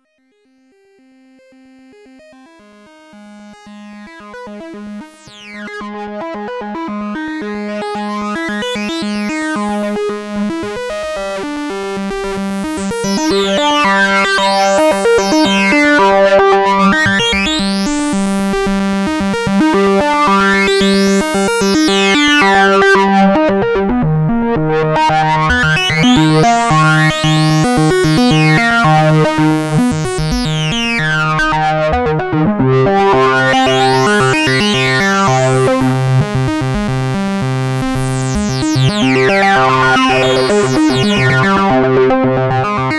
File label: Waldorf Rocket Dual Filter Boost